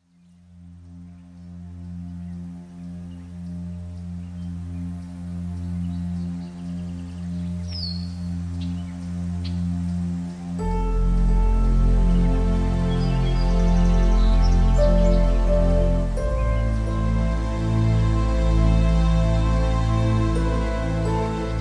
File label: Morning Meditation Relaxing Music With Bird Song